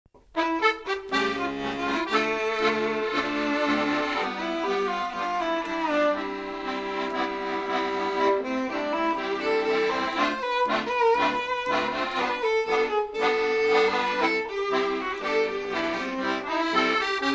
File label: Tango